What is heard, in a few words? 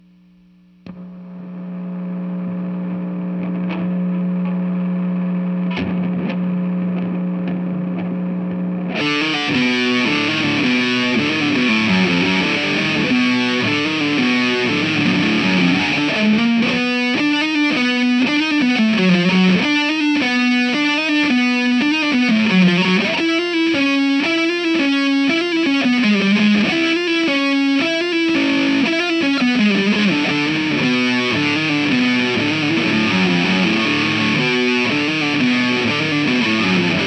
GTR